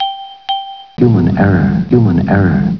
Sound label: error